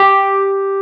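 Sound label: Wurlitzer G4 - L; USB Soundscan vol.09; AKAI; Keyboards Old School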